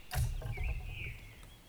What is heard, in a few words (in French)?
Chute de Bois à rebonds; Percu Bois